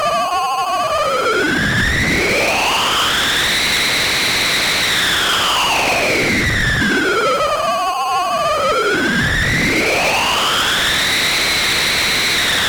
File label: RealRing noise sweep